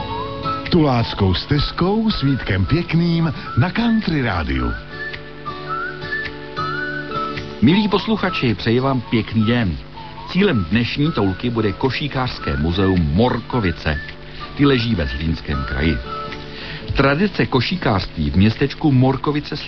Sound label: Country